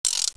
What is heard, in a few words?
Musica Critical Stop; Win98sounds